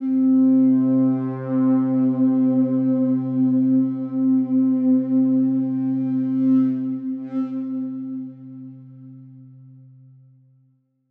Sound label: PAD (C); Humility; Cymatics; Orchid Sample Pack